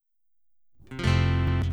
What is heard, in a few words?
instrumental